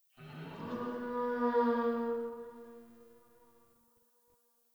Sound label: Tree Distant Critters Angry; Warframe; Duviri; Ambience